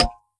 Candy Fall

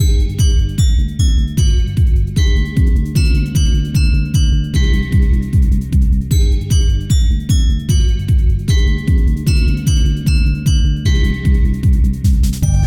retro puzzle music loop

